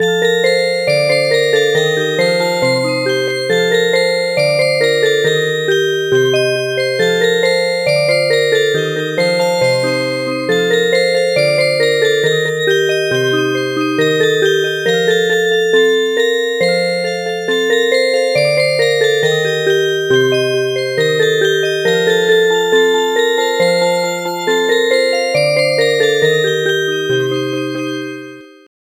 Music Box; Lorig

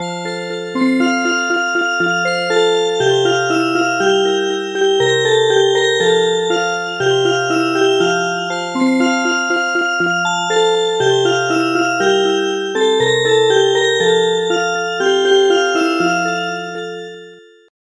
Music Box; Morning tide

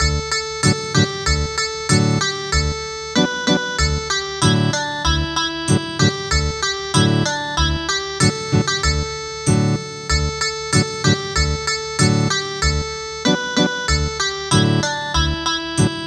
Japanese Processional Tune Ethnic Guitar

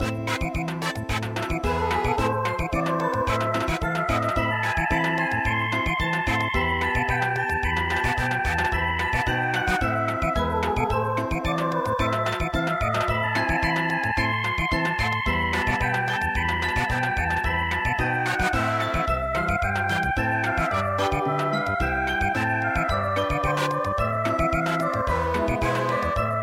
Rondo Alla Turca Samba

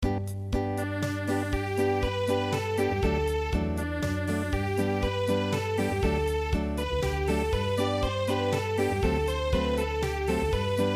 Unplugged; Kein schoner Land in dieser Zeit

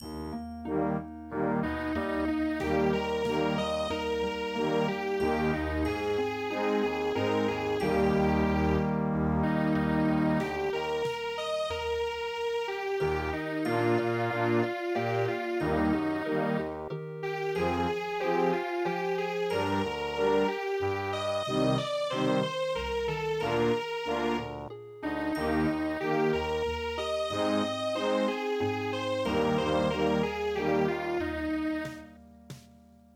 Sehnsucht Nach Dem Fruhling winer waltz

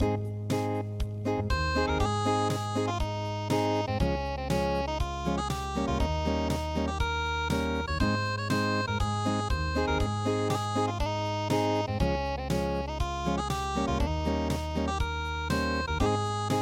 Tanoshii unpluged

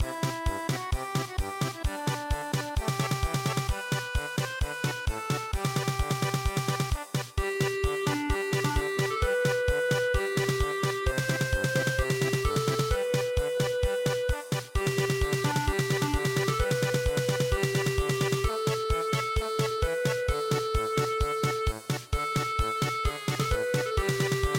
German Polka; Ive Been Workin On De Railroad

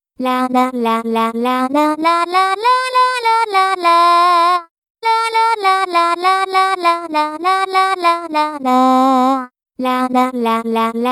voice; midi traditional vocal; Ball and lord

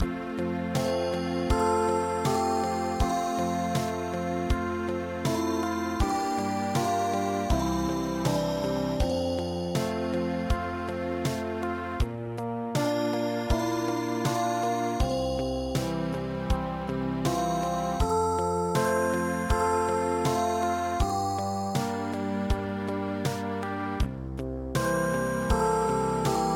Ballade

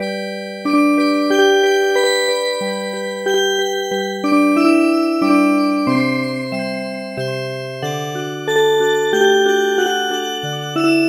Music Box

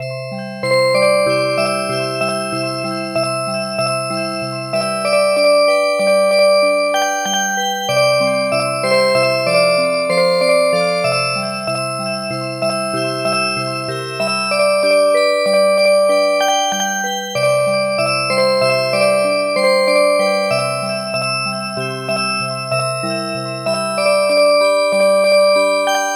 Music Box

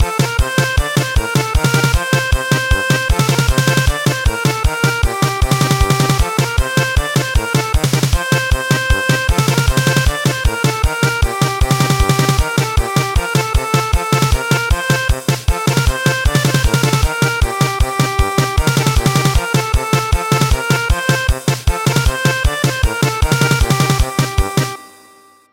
German Polka; Lorig

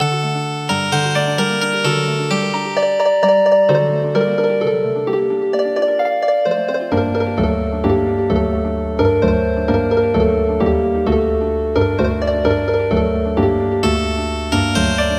Healin Harp

